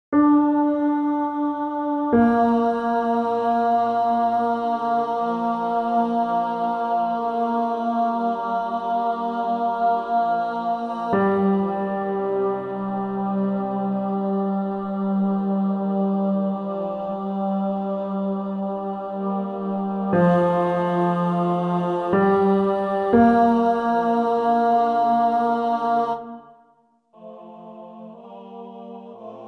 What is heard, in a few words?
Las Posadas alto